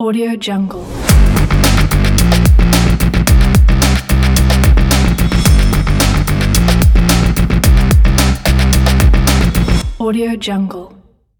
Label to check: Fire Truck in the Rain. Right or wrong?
Wrong